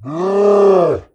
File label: ogre yell